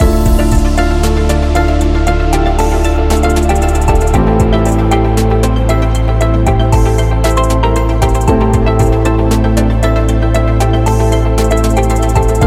Technology Loop

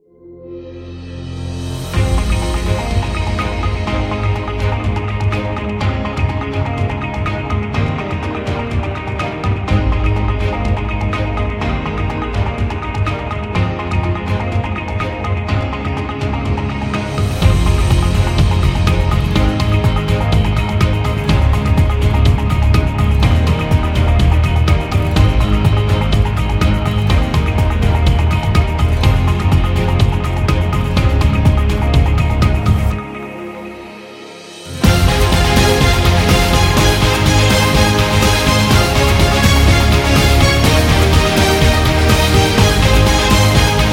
Dynamic Motivation, corporate music; corporate music vol 20

